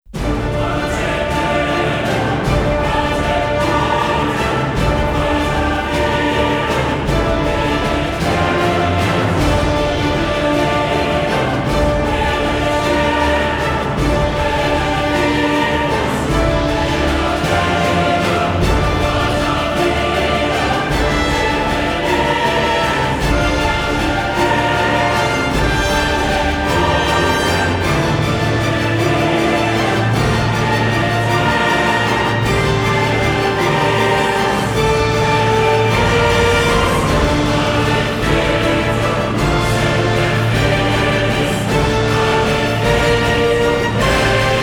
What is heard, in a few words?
No Drums, Orchestra and Choir; трейлерная музыка